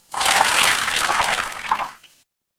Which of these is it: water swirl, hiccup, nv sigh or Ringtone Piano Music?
water swirl